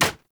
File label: Bow Impact Default; Weapon Impacts; Player; Bows; Hunter Bow; Environment; Envoy Hunter Bow